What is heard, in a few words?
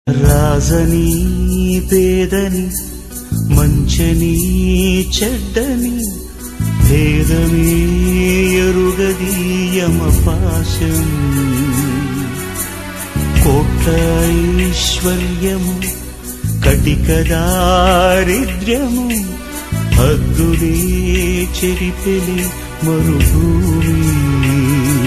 tamil ringtone